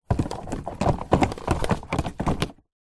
household mower electric grass catching basket attach hook on; zapsplat